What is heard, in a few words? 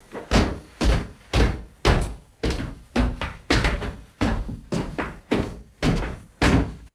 heavy footsteps on metal stairs